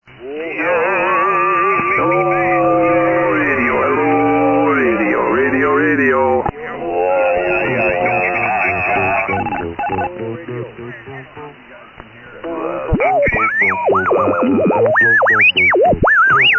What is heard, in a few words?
SSB Net Tune Up